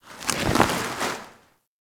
Settlement Cloth Ambience; Warframe; Grineer Settlement

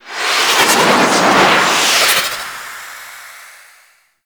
Archwing Fly In; Warframe; Grineer Fortress

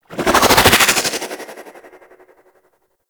Psychic Bolts Target A; Warframe; Nyx

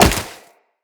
Wall Jump Snow, Footsteps; Wall Run; Characters; Tenno

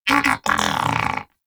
Moa Pet Tenacious Disagree; Pets; Warframe

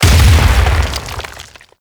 Brawler Barrier Collapse C; Warframe; Warframes

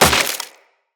Melee Impact Wood, Weapons

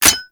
Heavy Shotgun Mech Clip; Warframe; Tenno

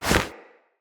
Holster Weapon, Foley; Tenno Alt

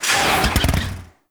Motorcycle power slide stop, bullet jump; Warframe; Motorcycle Ninety Nine

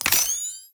Pistol Laser Aim; Warframe; Tenno; Lasrian

